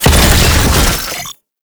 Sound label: Tank Drone Deploy; Enemies; Abilities; Warframe; Lasrian Tank